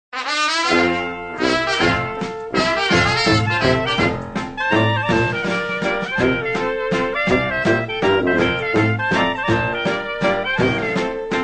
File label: laendler